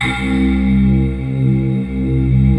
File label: SYNTH PAD